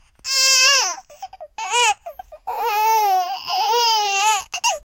baby cring a little bit

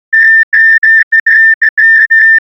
windows error code, error

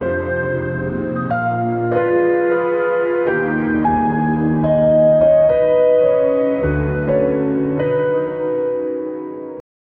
melancholic sy, moonlight

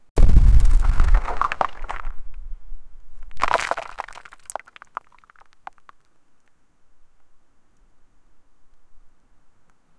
a gigantic rock cliff, rocks

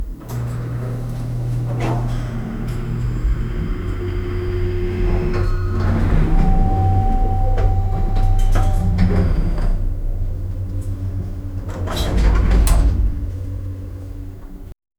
sudden silence followed; architecture

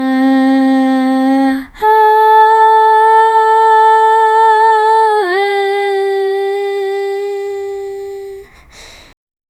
a young girls voice singi, vowel

